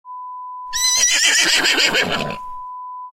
Horse whinny sound

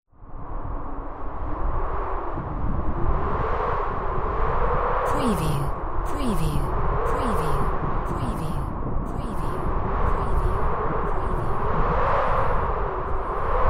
WIND COLD

